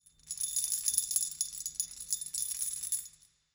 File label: ambience tamburine; netent